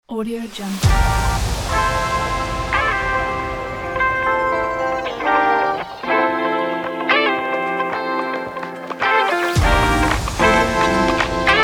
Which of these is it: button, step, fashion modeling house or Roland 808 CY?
fashion modeling house